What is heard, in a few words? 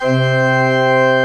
C3, CHURCH OR; Best Service; Advanced Media Trax Modern Composer